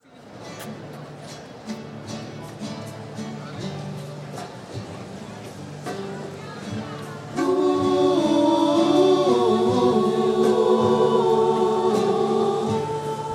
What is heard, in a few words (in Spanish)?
voces; misa